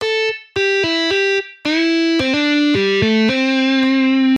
Rests example

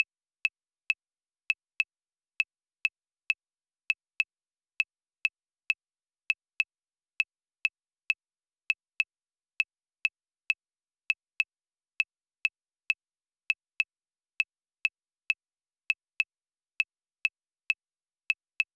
clave loop; 3-2 son clave

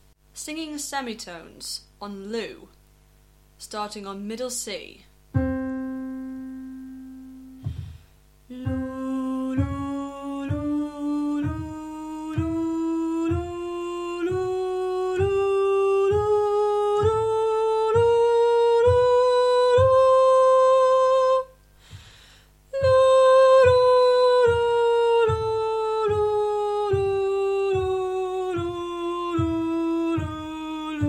Semitones vs tones

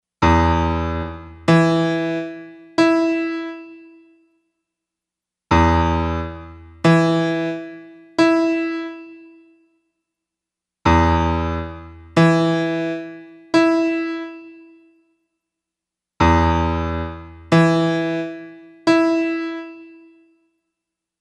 piano

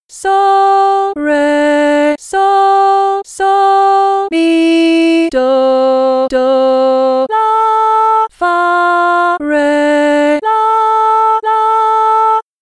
Hard Melody Solfa

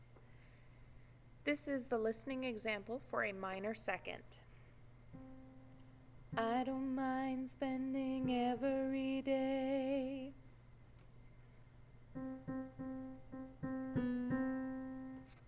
minor 2nd; Reference songs: Minor 2nd

